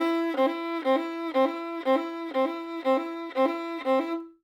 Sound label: violin